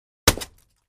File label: Axe Paneling 2 Side Du, axe hits